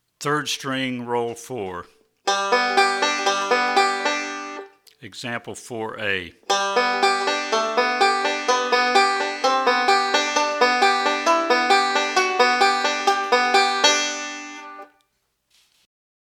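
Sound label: Rolls For Melody On 3rd String, banjo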